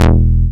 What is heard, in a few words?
Offbeat Bass E; Vengeance Samples